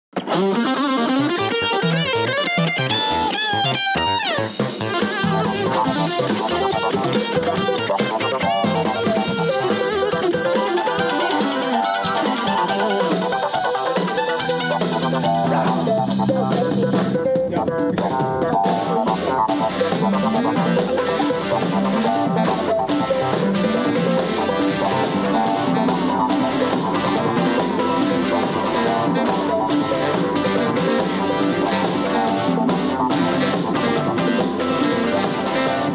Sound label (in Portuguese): musica eletronica